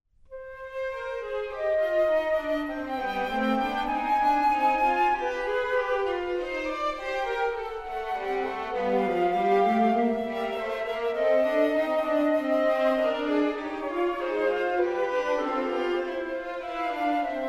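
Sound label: Canon 3 a 2 per Motum contrarium; J.S.BACH; The Musical Offering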